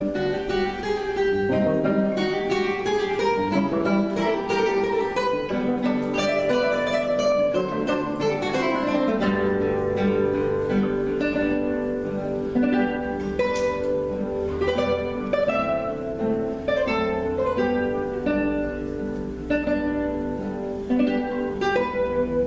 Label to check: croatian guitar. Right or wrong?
Right